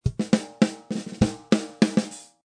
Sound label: Smack Funk Drm; Funk & Rock; Loopology; Get Dirty